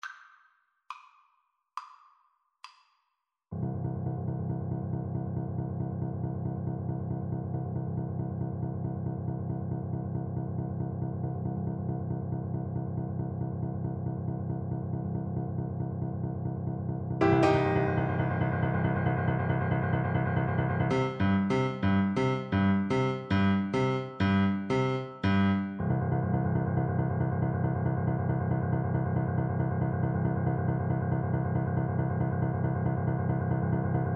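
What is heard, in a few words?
also sprach zarathustra, xylophone, Percussion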